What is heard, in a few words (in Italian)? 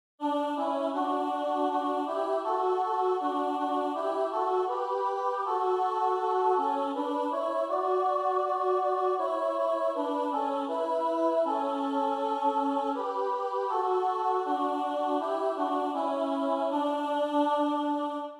soprani contralti